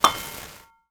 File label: Bead Pickup; Diner